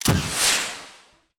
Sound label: tools launcher; teardown ps5 windows xbox series xs gamerip 2022